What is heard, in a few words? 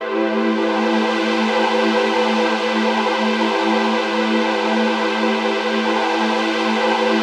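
chord pad, Pads; Best Service; XX Large - Pads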